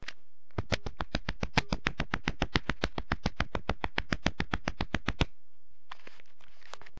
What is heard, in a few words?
applause